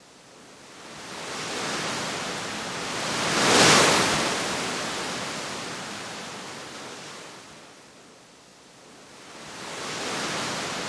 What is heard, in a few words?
Sound of Waves; Dreampad